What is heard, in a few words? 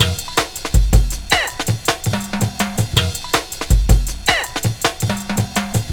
162, breaks; M8